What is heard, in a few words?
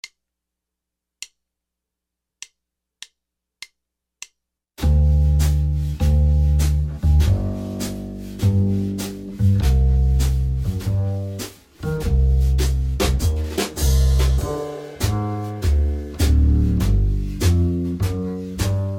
chord melody backing track, guitar